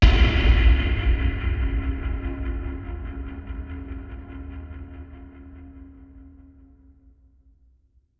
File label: Far Long, Cinematic Impacts; 99Sounds; Rumore